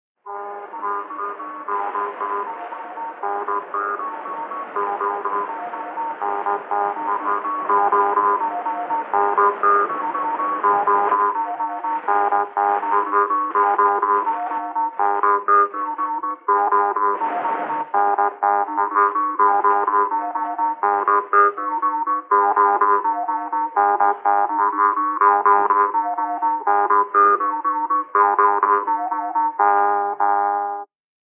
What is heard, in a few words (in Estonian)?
Ranna labajalg parmupill; Kirbla; folk